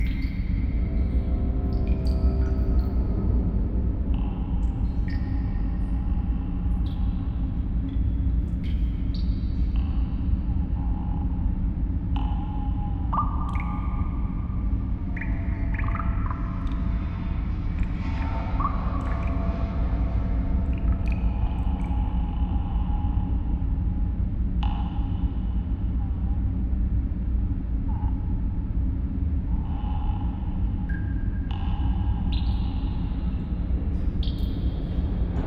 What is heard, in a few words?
Interrogation Cell Ambience; Death Or Glory